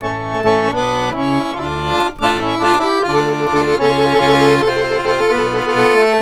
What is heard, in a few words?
ACC, Balkanish Traditions; USB Soundscan vol.63; AKAI